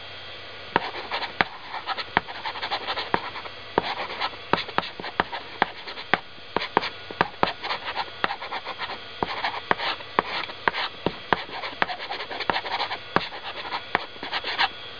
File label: chalk sound; The Amazing Spectacular Ordinary Bottle